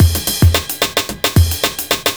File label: block rock kit